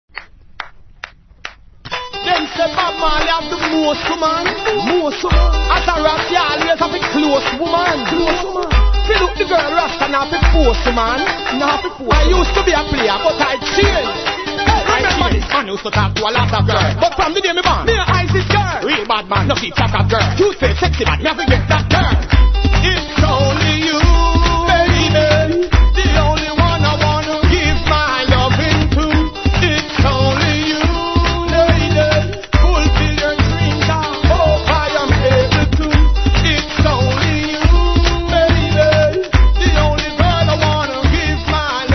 square dance riddim

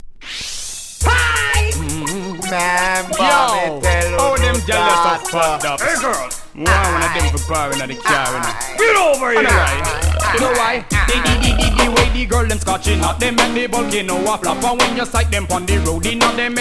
riddim

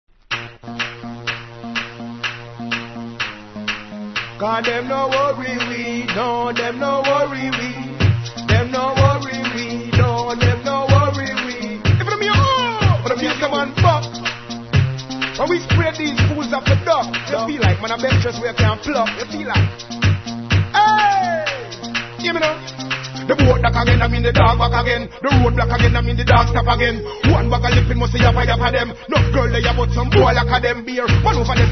applause riddim

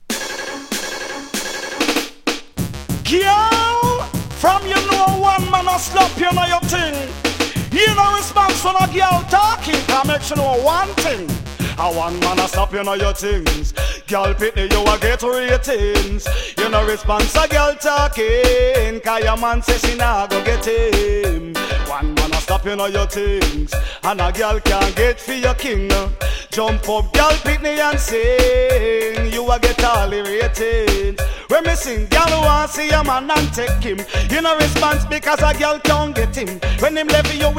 Riddim

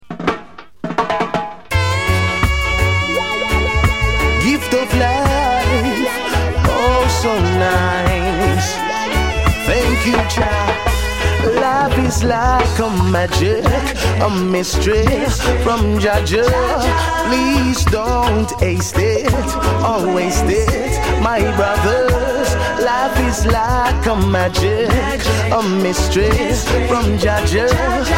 riddim

